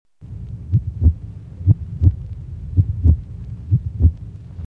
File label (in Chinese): 心跳音效